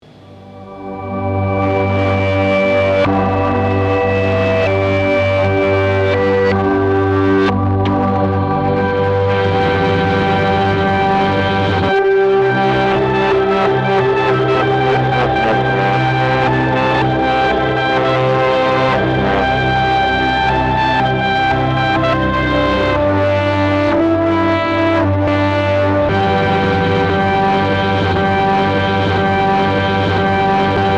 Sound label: asian triumph reverse; enso